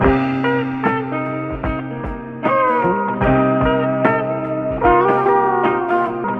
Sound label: guitar, Sad